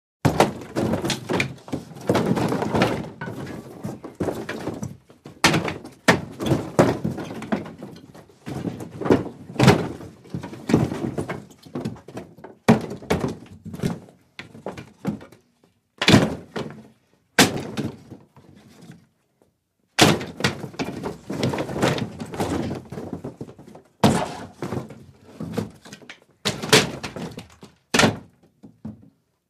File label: FIGHT: INT: Brawl; IMPACTS & CRASHES - FIGHTS; with crashing furniture, no grunts